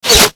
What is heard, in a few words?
Bullet Default Hit